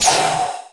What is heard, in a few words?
VEHICLES EXTRAS DUMP VALVE